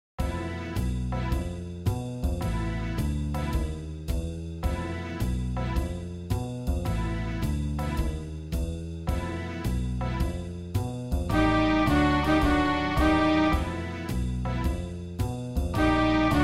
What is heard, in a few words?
Twelve Tone Walk